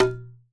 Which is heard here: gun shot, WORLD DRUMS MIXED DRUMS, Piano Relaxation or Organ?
WORLD DRUMS MIXED DRUMS